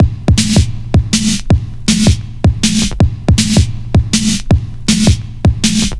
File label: 160, Drum Loops; Total Drum&Bass